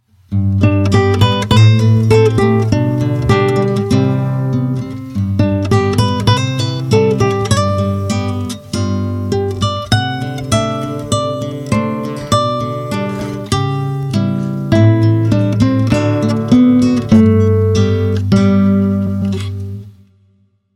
countryside

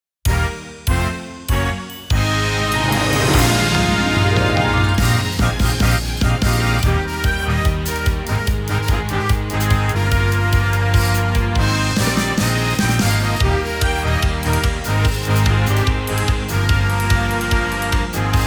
celebration

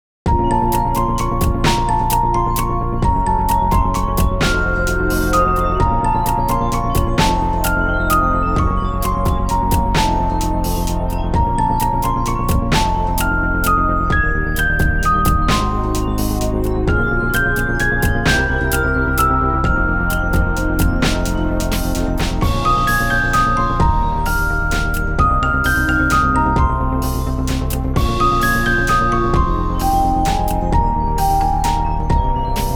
night crow

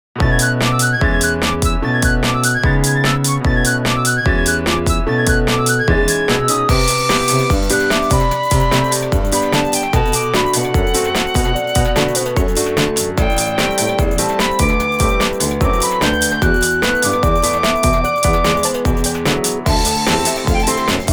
morning glory

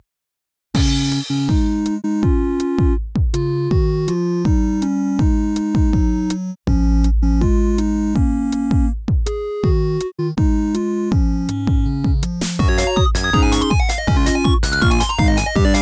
game start

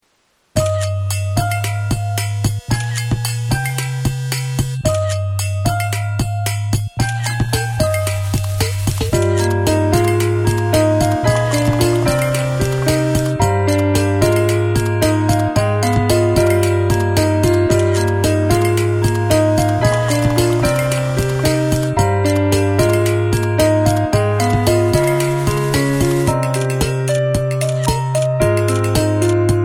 piano in the house

